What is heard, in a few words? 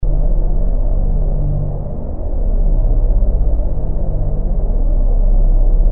Mech Drone